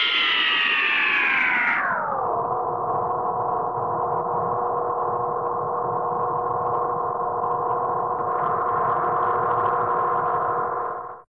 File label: vintage cartoon ufo squeal; Cartoon Sound Effects Kit